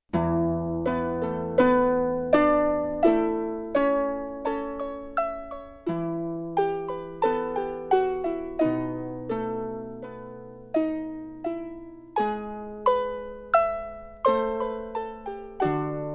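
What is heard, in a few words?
octave unison, Four Saxes; Yamaha TX16W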